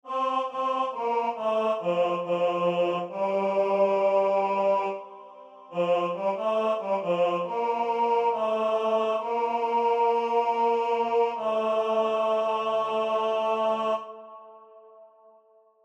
Bari, barbershop tags

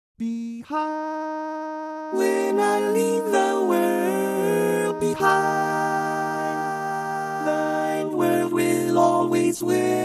barbershop Tenor, tags

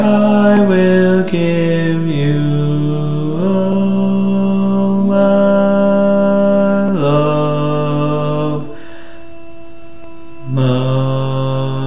Bari, barbershop tags

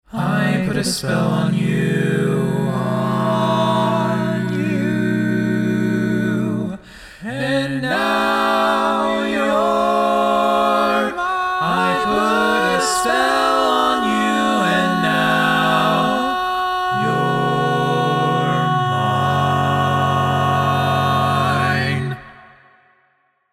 All Parts, barbershop tags

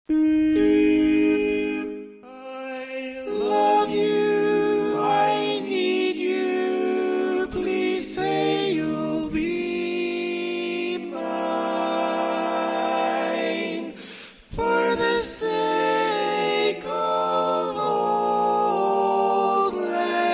Tenor, barbershop

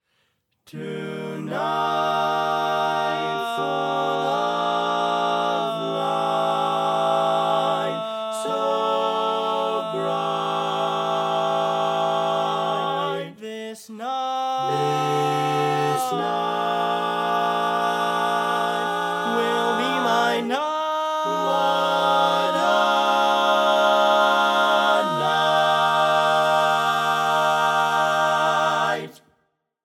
Tenor, barbershop

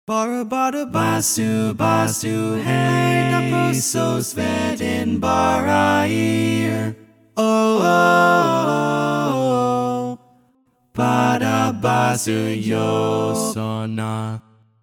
TTBB All Parts, Barbershop Tags; Each recording below is part predominant - one part louder, other parts quieter.

